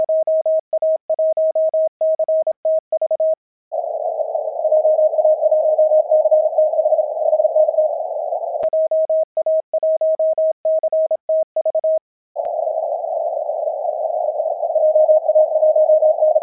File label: 20m CW